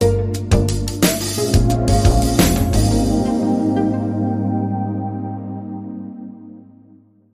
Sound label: The Big Beat Sting